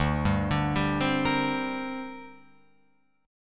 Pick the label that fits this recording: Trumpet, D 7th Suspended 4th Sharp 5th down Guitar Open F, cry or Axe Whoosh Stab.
D 7th Suspended 4th Sharp 5th down Guitar Open F